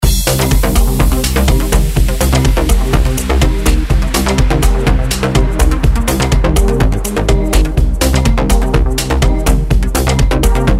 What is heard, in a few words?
Raid; Mist Train Girls